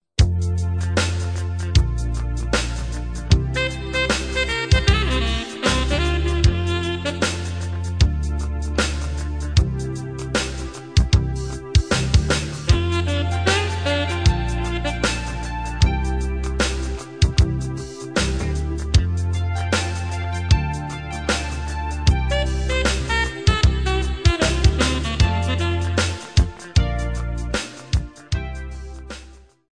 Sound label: steel pan sax